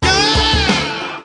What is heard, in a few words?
"yeah" SUNG